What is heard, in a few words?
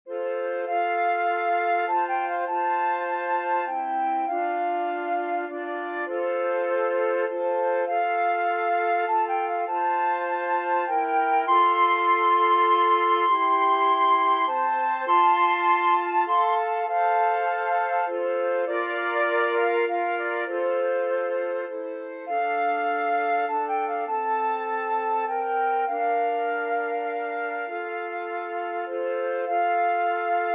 amazing grace, flute trio